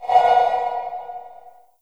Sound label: LH CB808, REVRB LARGE HALL